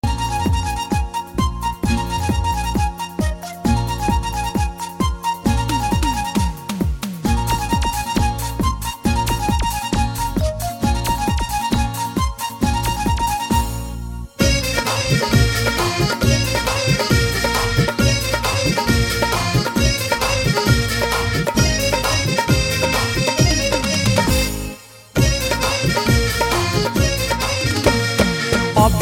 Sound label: Bhojpuri